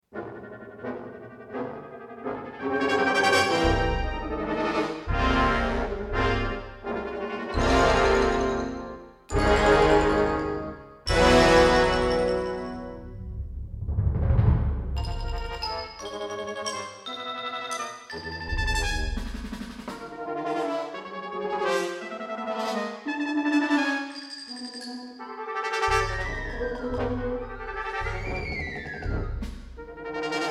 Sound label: Brass Ballet